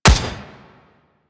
Gunblade Automatic Shot, Weapons

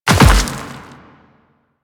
Land Dirt, footsteps; Warframe; The New War; Kahl; Quests

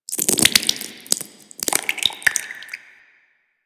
Taunt Flesh; Warframe; Proto Infested Ancient; Abilities

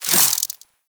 Spike Proj Whiz; Enemies; Warframe; Infested Ashen

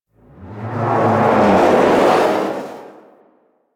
Teralyst Whoosh Step; Enemies; Steps; Warframe; Eidolon

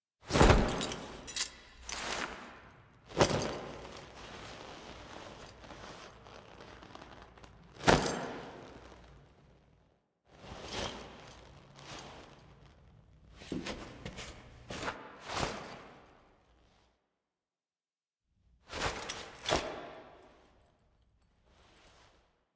Foley; Duviri Quest; Courtyard; Drifter

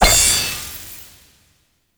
Glyph Ball Slot; Duviri; Glyph Puzzle

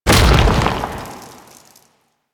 Arm Proj By Explosion; Warframe; Man In The Wall

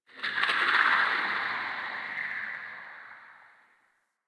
Interior Cave Texture Rock Movement; Warframe; Infested Eris; Misc Indoor

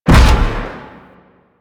Void Dragon Orb Charge Explosion; Enemies; Abilities

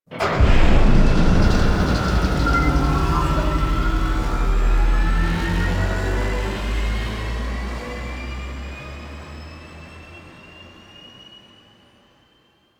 Spawn Engine Start; Warframe; Rail Jack

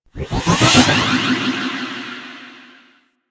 Hub Elevator Lift Start; Tenno; Warframe; Ambience

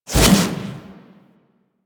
Melee Jump Slam Short Charge; Weapons; Whooshes; Warframe

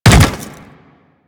Step Default; Leto

